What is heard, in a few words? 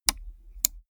gaming mouse left click single slow; maschinen; buero